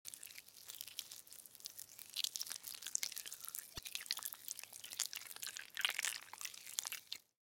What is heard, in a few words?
Slugs and Worms; Nightcrawlers; Blastwave FX